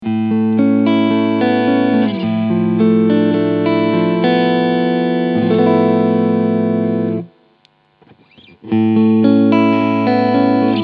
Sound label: Pickups Cleansounds